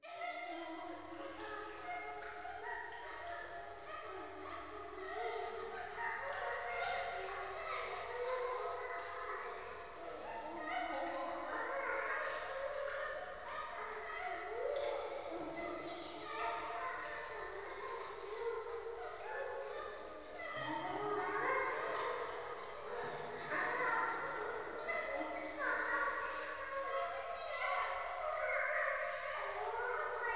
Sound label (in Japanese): 教会 喘ぎ 雨上がり